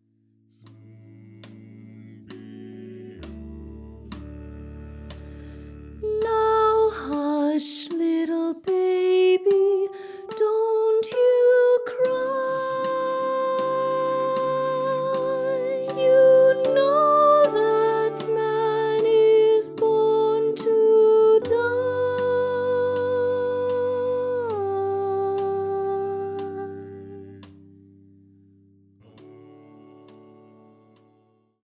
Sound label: SOLO